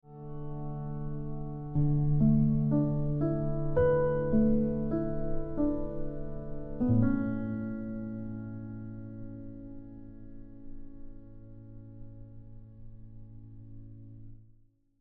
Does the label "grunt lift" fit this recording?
No